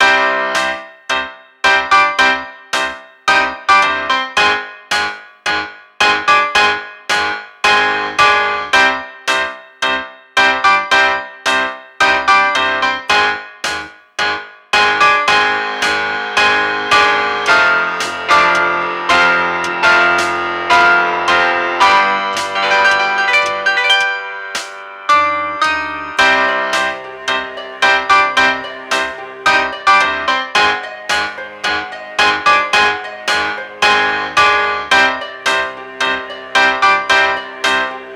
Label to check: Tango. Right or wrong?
Right